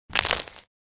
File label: Single step on cracking ice